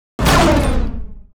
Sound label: Metal elf Gunner attack